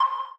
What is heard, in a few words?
submarine dry